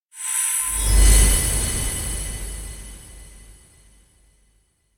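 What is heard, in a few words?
electronic pendant light line; anemoi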